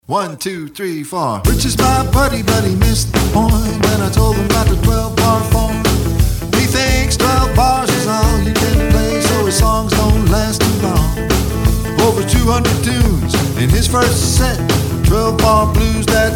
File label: Twelve Bar Blues Song